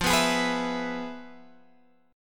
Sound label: F Minor Major 7th Flat 5th, F, Guitar, Open G; 15,16,18,16,17,x